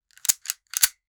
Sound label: revolver reload; weaponry